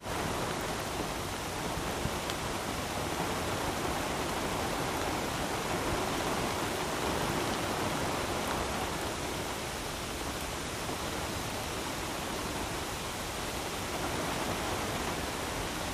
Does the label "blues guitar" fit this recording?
No